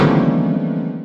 shocked sound effect; animal crossing

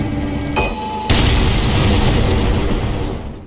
hammer full impact; ultrakill; jachammer

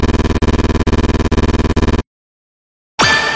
yellow soul transformation; undertale

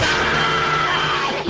screaming in pain; squidward

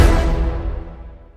kill sound; valorant

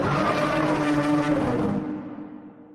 prowler siren

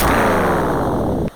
sonic laser fire

